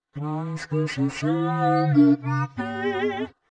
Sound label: soprano